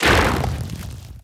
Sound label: bounce; carrionqueen